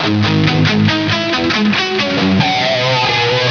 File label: loop